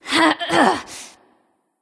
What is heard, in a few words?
grunt lift; smite; aphrodite